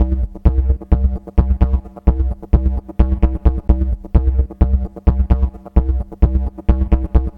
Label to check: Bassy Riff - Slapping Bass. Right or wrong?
Right